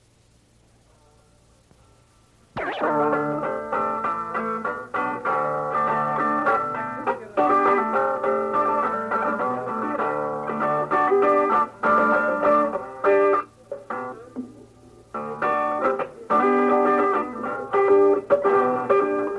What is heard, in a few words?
Instrumental